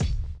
BD

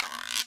Guiro Long

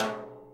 Tom Jazz Hi Rim

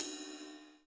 Ride Edge

